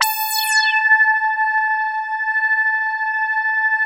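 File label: OBERHM OBXA, Oberheim OB-Xa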